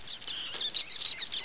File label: rats; zm rockhouse2